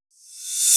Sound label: Reverse FX; Cymatics; Cobra